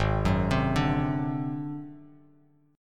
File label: Ab 7th Flat 5th Ab down Bass Standard; Ab7b5 arpeggiated; JGuitar